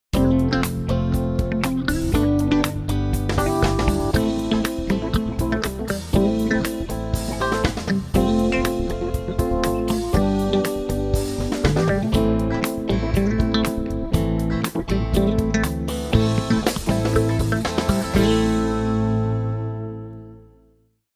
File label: Funk, ringtones